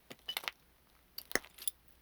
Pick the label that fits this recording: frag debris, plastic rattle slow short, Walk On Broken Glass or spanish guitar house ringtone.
Walk On Broken Glass